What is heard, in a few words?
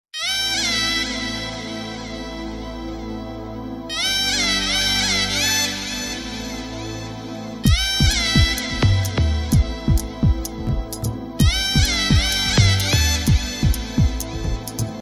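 background music Telugu Ringtone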